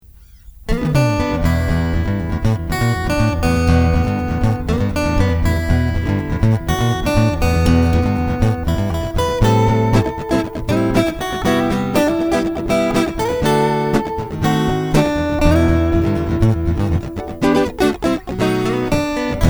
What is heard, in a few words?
gutar